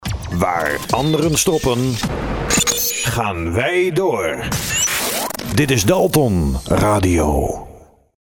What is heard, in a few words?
Jingle; Audio Fragmenten; Arkan Radio 2